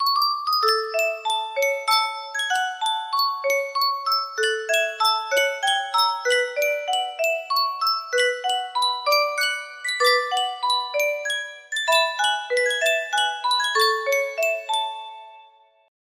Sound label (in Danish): yunsheng spilledase